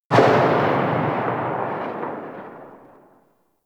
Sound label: Grenade Launcher Tail; Warframe; Grineer